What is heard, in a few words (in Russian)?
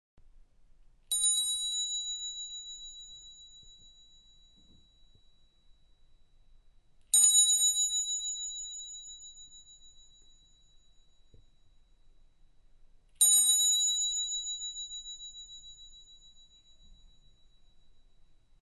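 валдайский колокольчик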